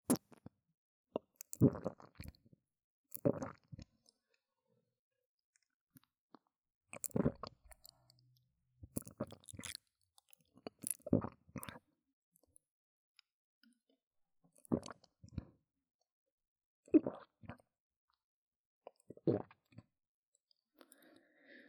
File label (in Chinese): 吞嚥 耳邊・左; 特典吞嚥音